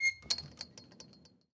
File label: door chain link close start; Rust